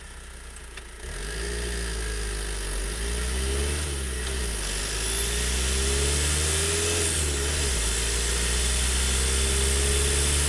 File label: ford transit connect t200 driving on asphalt; Sonniss GDC